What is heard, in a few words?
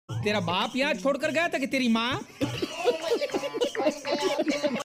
laughing; uday shetty; audio meme